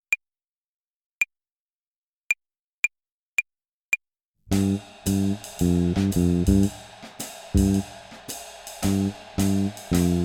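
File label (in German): Swing Phrasierung Übung; play along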